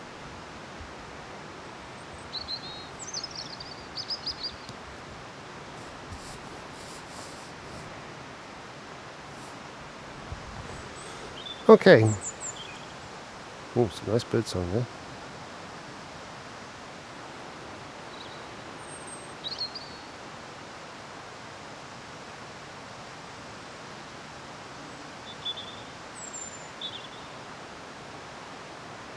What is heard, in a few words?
woodland birds